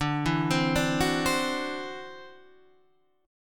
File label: E 7th Suspended 2nd Sharp 5th D, chord